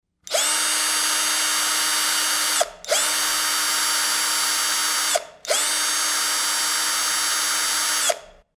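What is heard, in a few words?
sound of screwdriver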